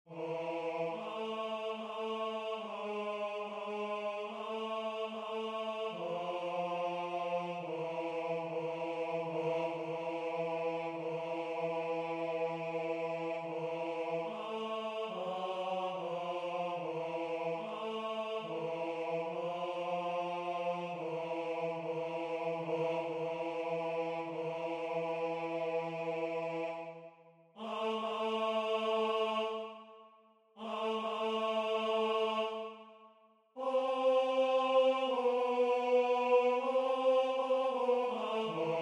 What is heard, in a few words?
Tenor